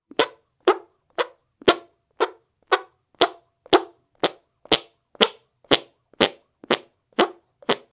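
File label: fart sound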